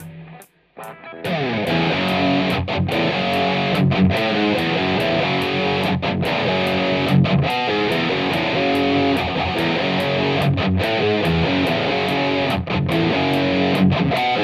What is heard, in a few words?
jcm800; podxt